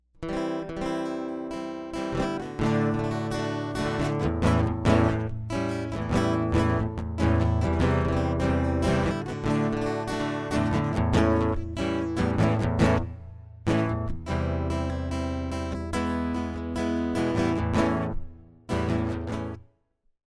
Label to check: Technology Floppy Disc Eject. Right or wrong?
Wrong